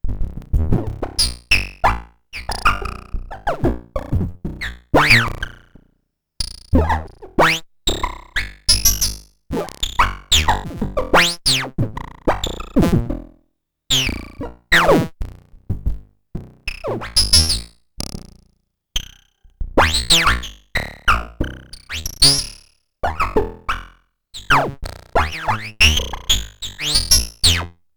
Anamono Xmini one Voice Short Delay OSC1 Portamento; Gotharman